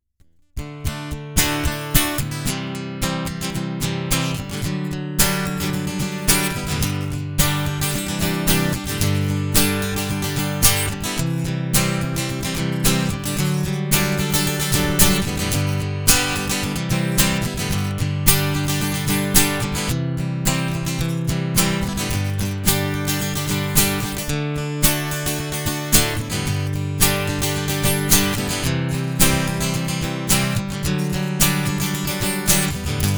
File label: guitar